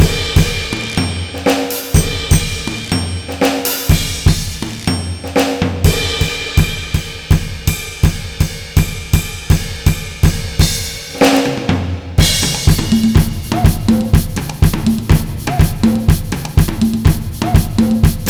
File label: Pop